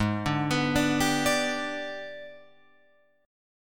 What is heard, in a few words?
Minor Major 7th Flat 5th, Guitar, Open F; G#mM7b5 arpeggiated; 8,9,11,9,10,9